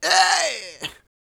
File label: YELL, MALE HUMAN; Spectrasonics Vocal Planet